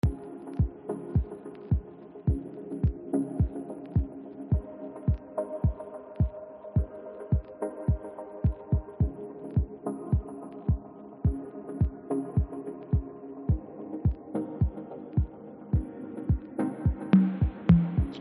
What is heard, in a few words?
music